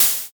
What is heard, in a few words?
open hat